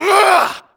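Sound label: effort heavy, voice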